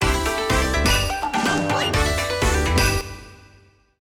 boot Sound; Taiko no Tatsujin Wii U Version

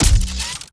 Grenade Launcher Fire Heavy; Gunartl; Robobeat

